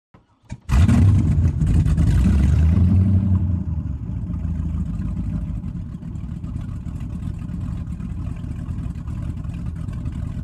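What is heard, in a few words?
Power Boat Interior Start Idle, off shore power boat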